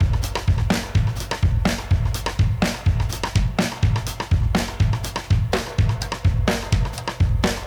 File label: 125 Sprung Breaks; Loops; Touch Loops; ClassicBreakSessions